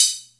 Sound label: Atlantex Music Percussion Computer HH closed, drum machine